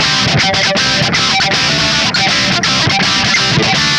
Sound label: osc, guitars; 120 DISTO; Loopasonic